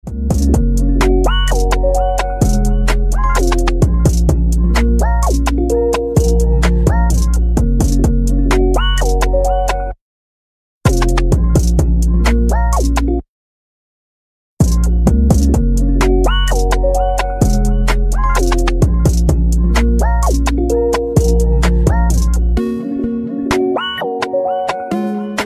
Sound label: moonlight instrument ringtone; android